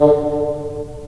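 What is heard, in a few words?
wind bassoon; LA Composer vol. 3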